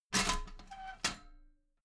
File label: metal ladder small handle unfold regular